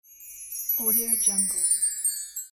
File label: wind chimes